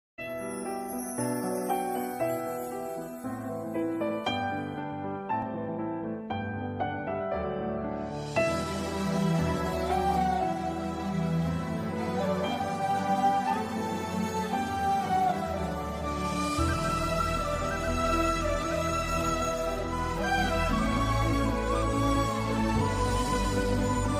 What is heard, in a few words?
villain entry background music; prabhas trisha charmi